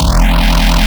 E Bass Shot; neuro; BIG5AUDIO

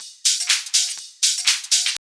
house loop

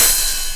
HH OPEN

